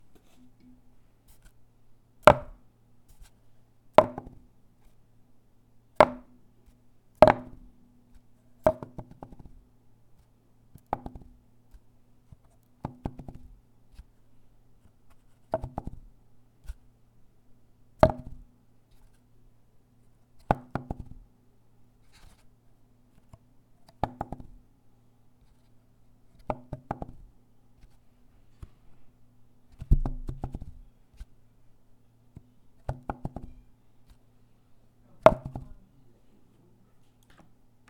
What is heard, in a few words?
Paper Cup Falls